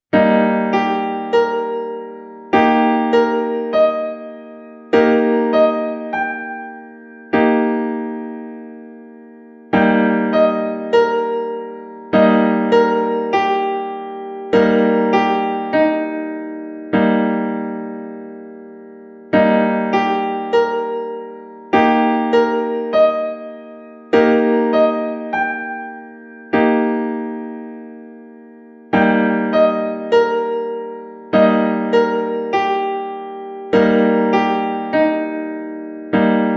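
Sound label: D sharp Eb Piano Keyboard Chord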